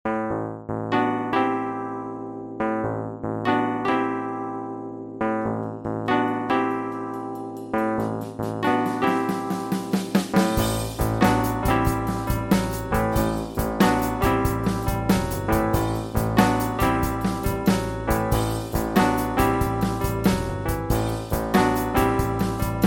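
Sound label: wurlitzer 210 electric piano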